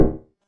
reset

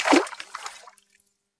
build mangrove

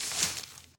monoculture warn

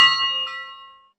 harvest start, sfx

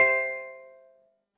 ingredient solved

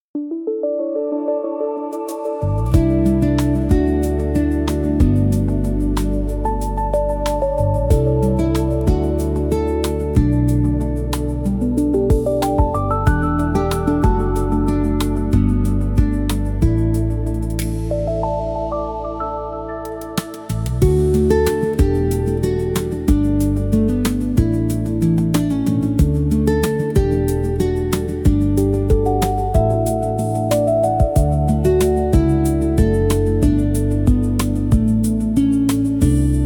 alpine freight light

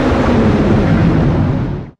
vehicle plane

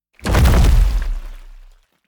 crash Birds; heli

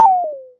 block warn

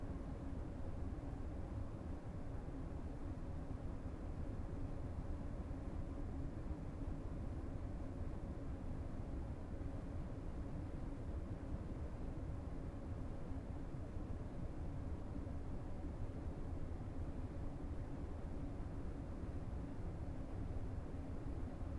ambience

